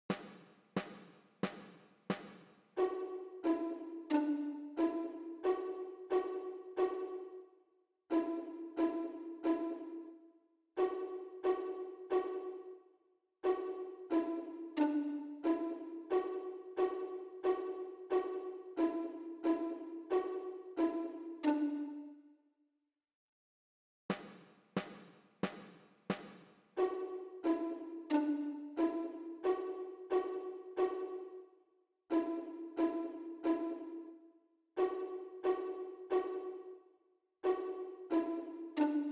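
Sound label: mary had a little lamb violin pizzicato; vln pizz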